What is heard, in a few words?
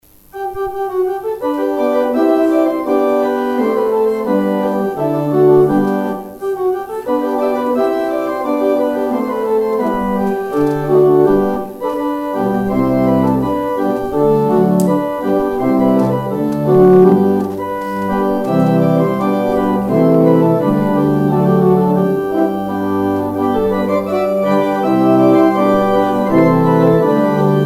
organ outro